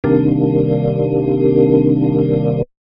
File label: Sampled minor 9 chord